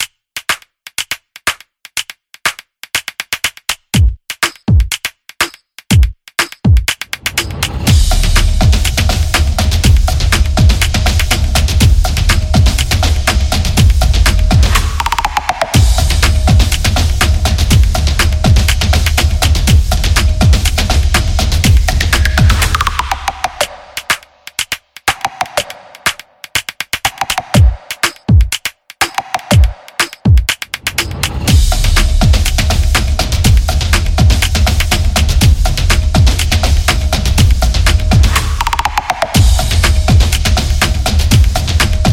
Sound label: Opener